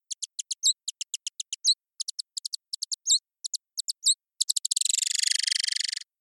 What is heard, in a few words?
brasiliensis, bat songsters